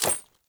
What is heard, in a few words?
Generic Footstep; Warframe; Uriel